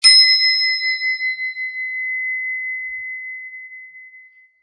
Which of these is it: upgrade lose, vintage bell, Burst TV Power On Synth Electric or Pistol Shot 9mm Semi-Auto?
vintage bell